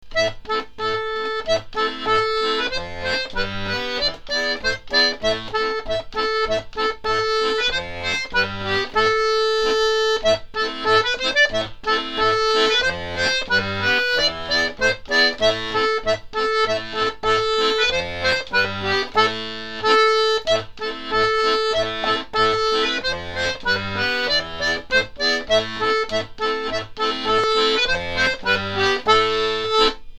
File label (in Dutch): berendans acc.